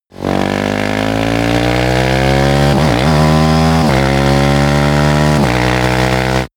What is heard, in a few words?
motorcycle motorcross accelerate